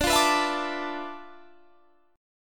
D Minor 6th Add 9th D, Guitar, Standard; Dm6add9 strummed; JGuitar

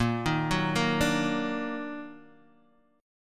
Eb Minor Major 7th Bb, chord

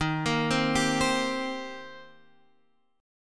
Eb 6th Eb down Guitar Standard

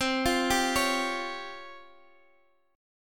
C sharp Major 7th Flat 5th, chord

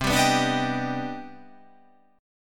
C Minor Major 9th, chord; down; chord shape

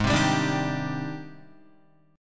Ab Major Sharp 11th Ab down Guitar; AbM#11 strummed; JGuitar

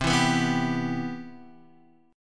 C Major 7th, Guitar DADGAD

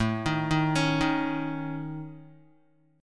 A Major Flat 5th A Guitar, chord; arpeggiated

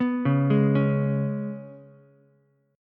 G sharp Minor Double Flat 5th G sharp, Ukulele; G#mbb5; arpeggiated; JGuitar

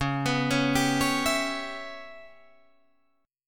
C sharp Minor 7th Flat 5th C sharp Guitar Drop D

